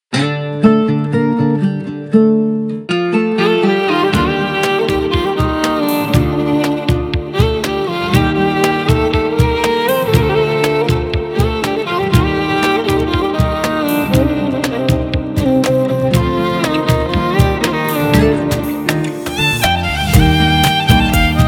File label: Violin